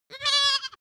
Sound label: goat baa lamb classic short